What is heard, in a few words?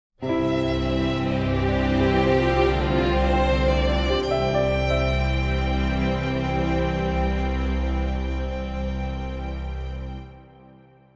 end jingle